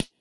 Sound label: click short confirm